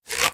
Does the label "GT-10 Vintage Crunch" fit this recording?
No